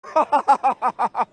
bruce darnell lachen

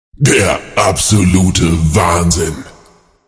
absoluter wahnsinn bonus jingle

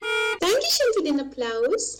applaus

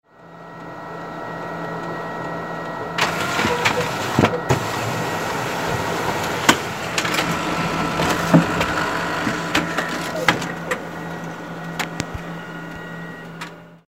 drucker

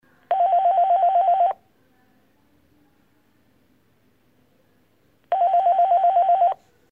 ep tel extern

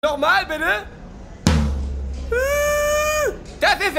trommel nochmal; trommelpeter

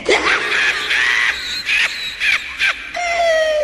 hyanen lachen

